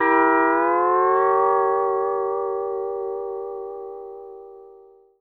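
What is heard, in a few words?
pedal steel chord E dim7 L, Country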